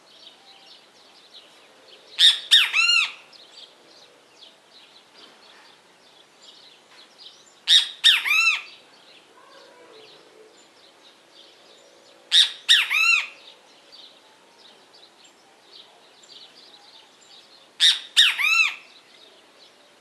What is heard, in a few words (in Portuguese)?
O canto e o comportamento do Bem te vi